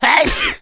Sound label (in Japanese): kushami